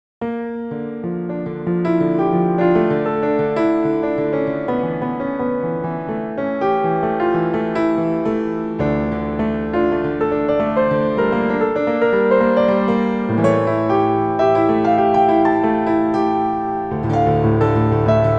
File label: Piano